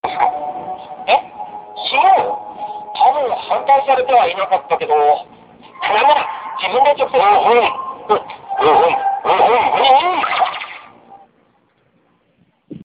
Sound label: Coughing